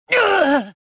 pain; reborn

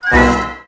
Hit

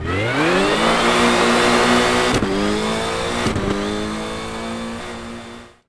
subaru, gravel; Colin McRae Rally 3

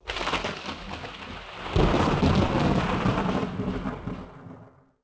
thunder; Pirates Of The Caribbean

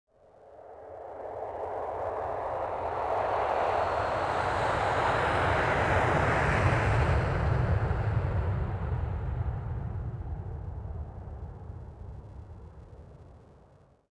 plane pass, airport; Soldier Of Fortune II Double Helix; XBOX; ambience

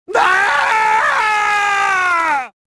falling

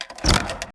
Wooden Desk Drawer Locked; table; Max Payne

